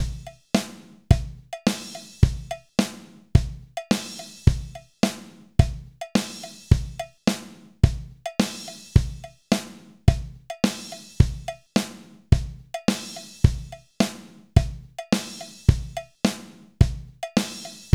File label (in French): batterie